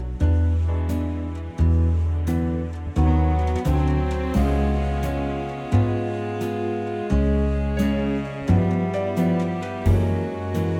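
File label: A, backing track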